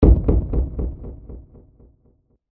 Hit Impact Delay, Long, Horror; Production Element, Imaging Element, Accent, Transition; Miscellaneous Impact

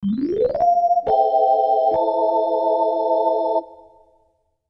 Logo Organ Key Slide Chord; Sonic Logo Sound Effects; Production Element Imaging Element Accent Transition

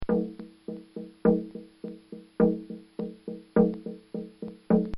Cherokee War Dance Drum Beat; Drums; Musical; Bobby Cole

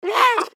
Panther Meow; Leopard Sound Effects; Animal

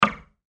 Impact Metal Steel Beam Hit; Sound Effects:Materials; Blastwave

